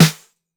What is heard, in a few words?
SWUNG SNARE AND HH; Basement drums vol 2